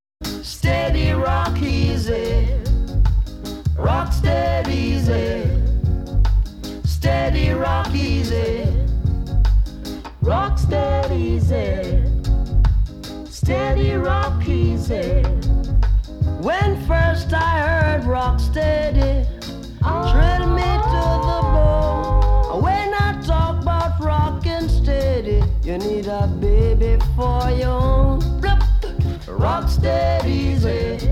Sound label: Rocking Steady, Reggae